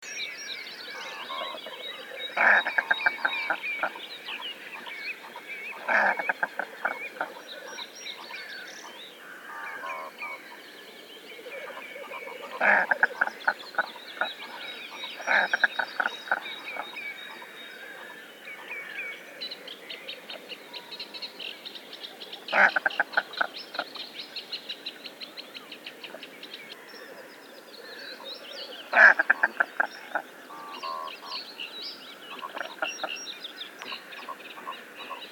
Common Merganser Mergus merganser

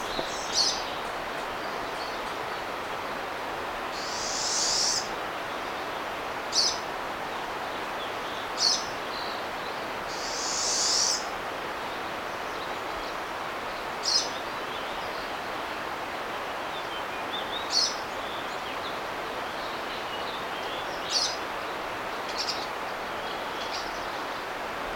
Pine Siskin

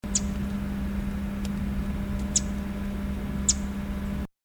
Pacific Wren

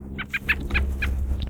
robin; birds

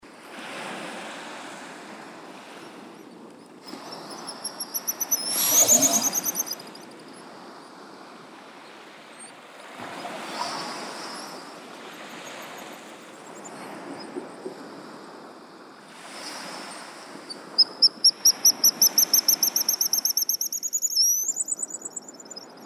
Pigeon Guillemot Cepphus columba

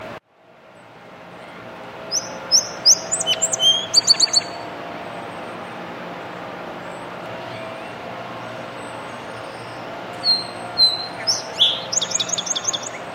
Song Sparrow Song

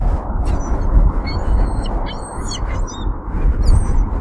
Gull calling with head pointed to the sky; birds

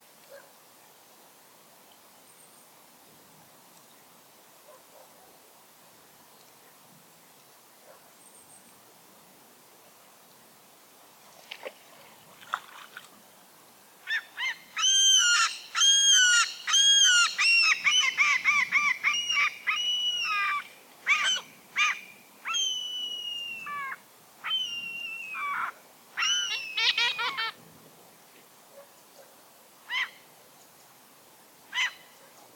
Loonscape, birds

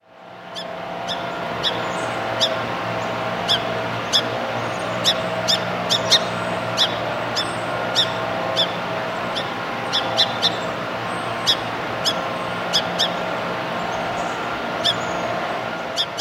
song sparrow call

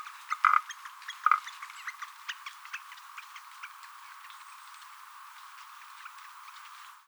call, birds

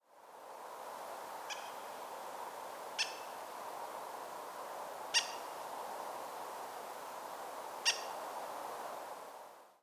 Coopers Hawk Accipiter cooperii

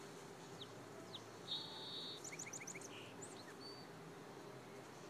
Song Sparrow Song

